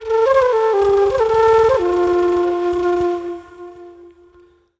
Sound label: FLUTE ETHNO 125